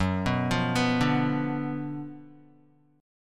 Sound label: Gb Major 7th Suspended 4th Sharp 5th, Guitar; GbM7sus4#5 arpeggiated; JGuitar